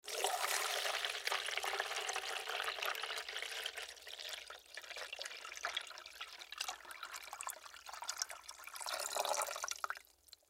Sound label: Coffee Pot Pour, Coffee Pot Pour Water Fill Liquid; Appliance; Household; Appliances; Blastwave